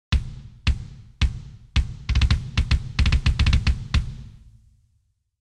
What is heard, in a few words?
kicks, punk rock kit; wild boar; klangmacht